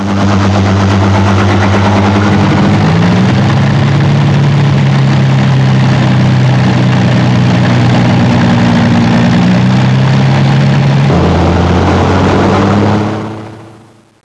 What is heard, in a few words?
aircraft, heink; warbirds